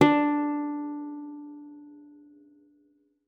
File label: D4, guitar; Xsample VOL-2